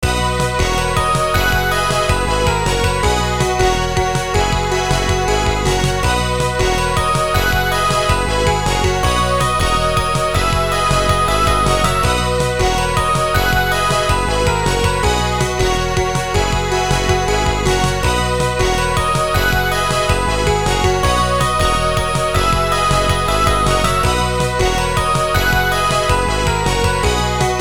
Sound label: ENDING; FRUIT FACTORY 2